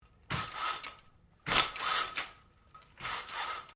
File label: Blinds Venetian Adjust, Household blinds; Living Room